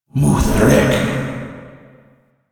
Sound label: Alt Fire Whispers; Warframe; Tenno; Grimoire